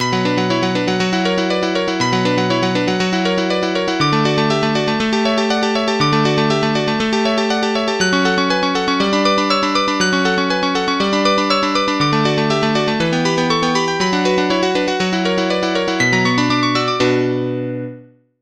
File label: very fast nocturne sound